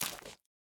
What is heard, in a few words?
reel Bounce; netent; butterflystaxx